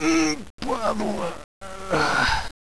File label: alpha die; svencoop; paranoia